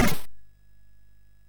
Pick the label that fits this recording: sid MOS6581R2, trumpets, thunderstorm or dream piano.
sid MOS6581R2